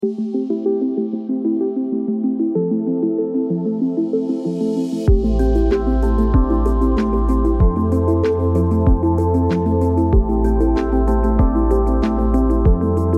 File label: dreamy synthwave glow